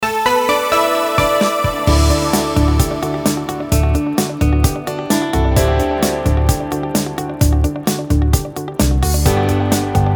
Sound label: Traffic Main ID; Radio Salzburg